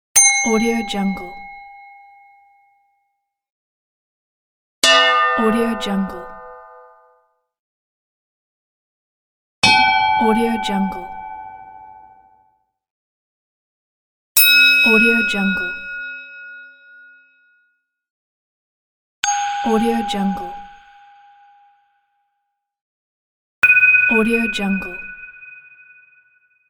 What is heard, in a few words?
epic bell sfx pack